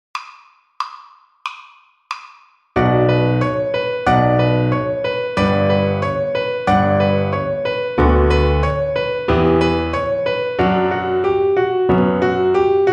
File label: String Gym, Piano Accompaniments